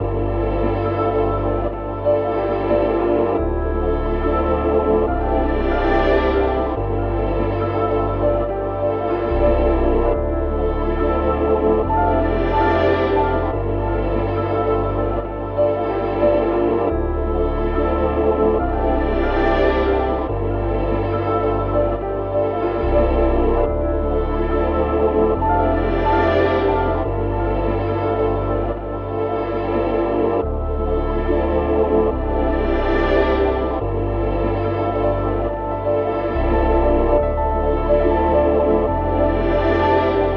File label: 142 BPM D Min, Melodies; Linear Path; Cymatics; Immortal Production Suite Beta